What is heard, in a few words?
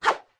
Bottle Swing; Swing a bottle (Recorded); Zelda